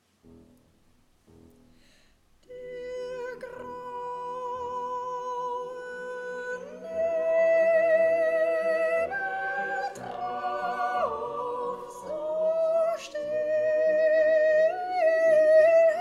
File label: tenor; Spatherbst Brahms Op 92, no 2